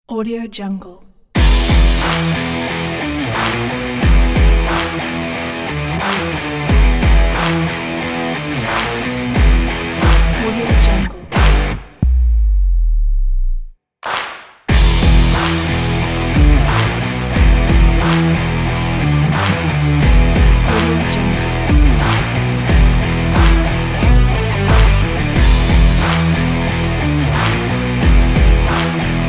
rock epic trailer sport

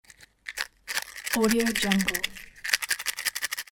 match box shaking

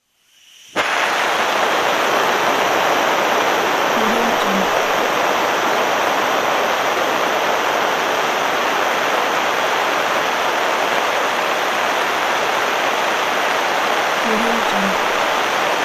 blowtorch

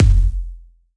perc low; soundmorph

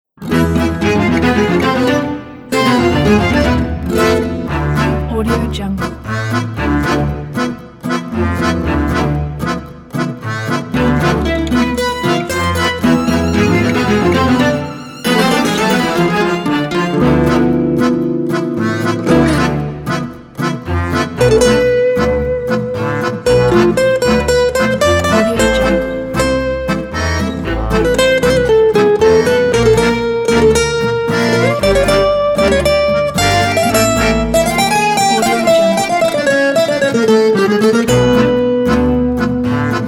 tango

